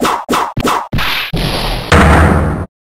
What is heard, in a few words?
omnislash; twilight